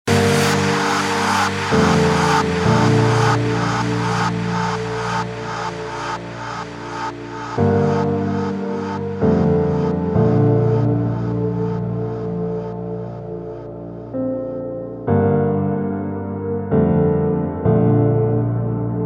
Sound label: Piano Acoustic Version